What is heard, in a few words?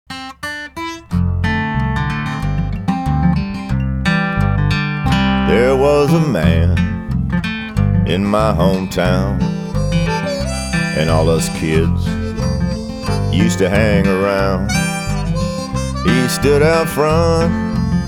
guitar, americana